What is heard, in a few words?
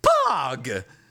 Ambient; rlcraft-1.12.2; playerbosses